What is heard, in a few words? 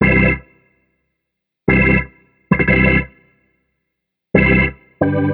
Stab; орган